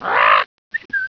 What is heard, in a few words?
parrot